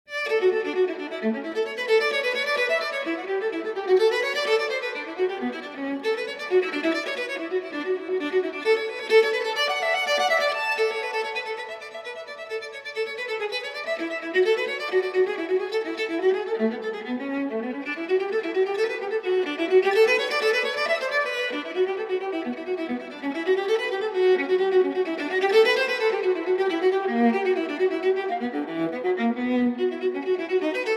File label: Violin Sonata III. Allegro (Arr. For Viola)